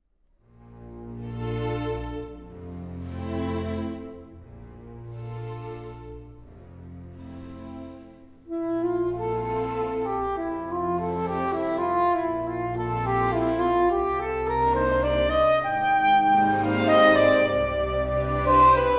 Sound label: Waltz in A Flat Major arr. for saxophone